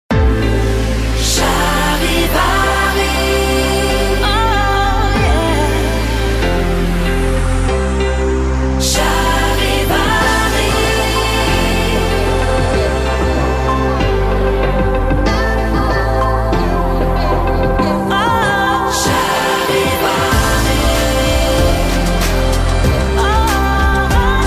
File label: Main Theme Slow; Charivari